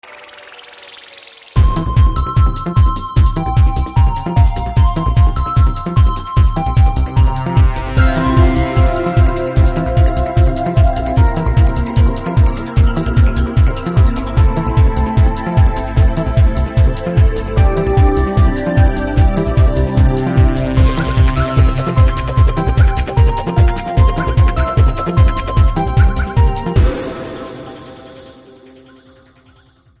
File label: techno